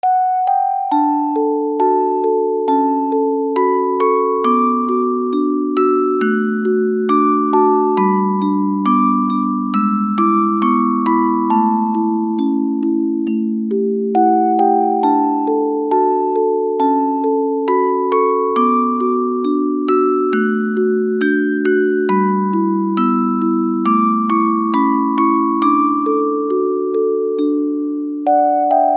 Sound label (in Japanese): orgel